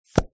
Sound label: popup open; gardenbloom